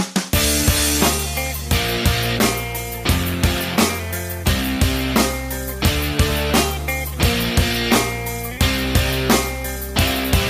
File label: pop rock